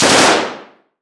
Weapon Gun Machine Gun Thompson Multiple Shots Interior Shooting Range; AdobeCC